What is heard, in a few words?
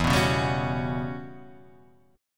E Minor 6th Add 9th chord